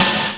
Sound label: funk snare, drums snares; Zoom - Release 2; Active Software; akai